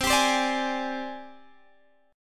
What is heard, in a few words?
Ab Major Sharp 11th C